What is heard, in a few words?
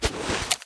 axe draw, weapons